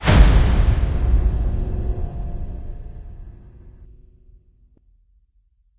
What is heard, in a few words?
Combat Transition